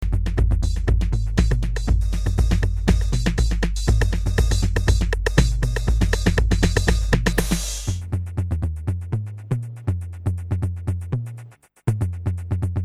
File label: 808 Ruffneck Business